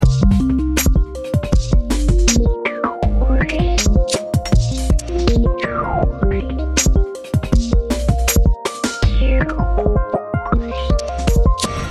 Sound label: filter